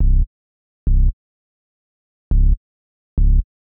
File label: Bass, 104 Cmin; Hip Hop Exotica; Big Fish Audio